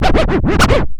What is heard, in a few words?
ST SCRCH, SCRATCH; AKAI S-Series CD-ROM Sound Library VOL-4